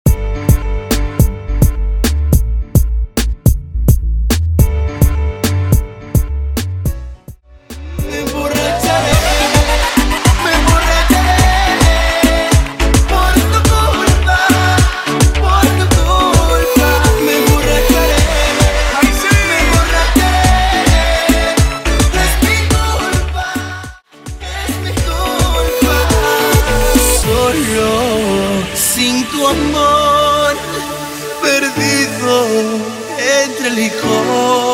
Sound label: Reggaeton Intro Outro 106 BPM